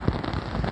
Burning; the-purple-night

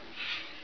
snail retreat; the-hat-chooses-the-wizard

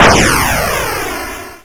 ship explode; hyperspace-roll

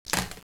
Multi Roll; hyperspace-roll

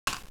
Single Roll; hyperspace-roll

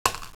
Single Roll; hyperspace-roll

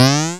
shield up; hyperspace-roll

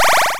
send burst shield; hyperspace-roll

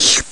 slime death; the-dungeon-puzzlers-lament

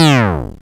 shield down; hyperspace-roll

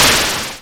burst shield hit; hyperspace-roll

